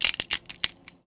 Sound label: Foley